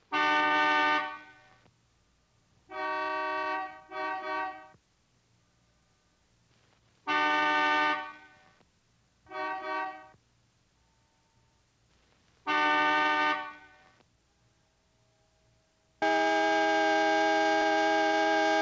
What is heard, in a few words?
Old Car Horns